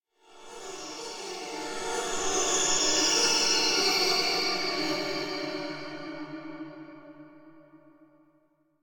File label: Metallic High Pitched Transitional Tone - Eerie Musical Horror; Hollywood Edge; sound designer's toolkit v2